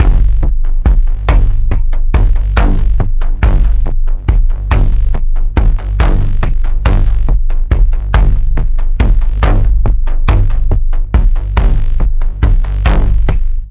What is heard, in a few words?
Pulse, G